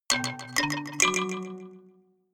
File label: notification kalimba delay triad up minor low